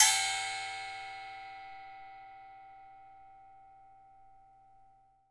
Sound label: RECO RECO, Brazil Drum Perc; E-MU Producer Series Vol. 7 – Old World Instruments